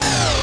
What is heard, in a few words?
repair; MAX